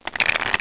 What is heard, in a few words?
shuffle; letride